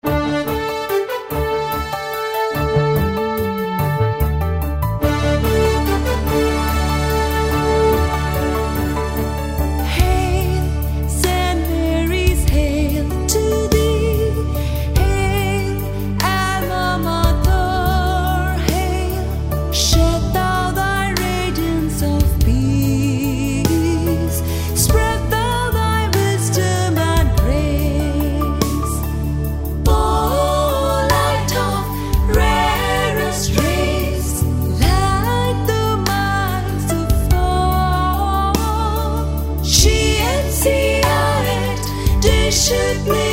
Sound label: Anthem